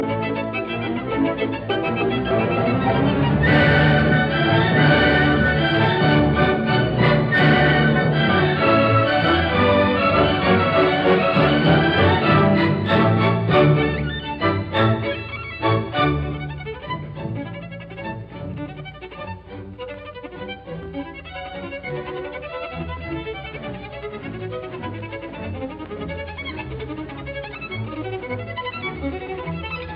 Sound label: Violin Concerto in D major, Allegro giocoso ma non troppo vivace; Brahms; Op 77